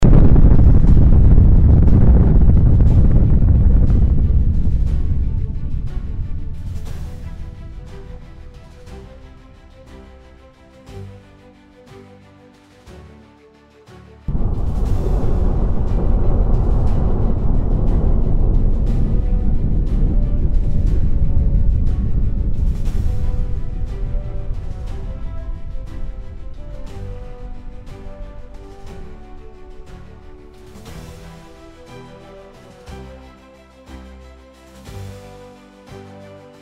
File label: Missile launch far away